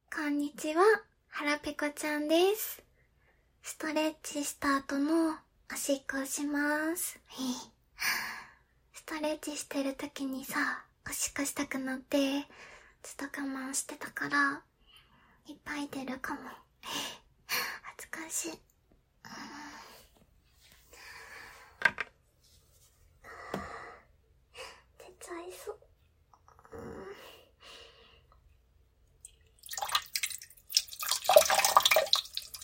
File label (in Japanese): おトイレで！オシッコですッ！オナラも出ちゃってゴメンなさい！お腹も鳴っちゃった！; ストレッチ後に！